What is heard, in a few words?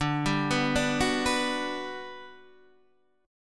G Major 7th, D